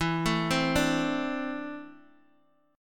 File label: E 7th Suspended 4th Sharp 5th E down Bass Standard; arpeggiated; chord; JGuitar